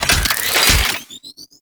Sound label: Cart Turret Deploy; Venus; Turrets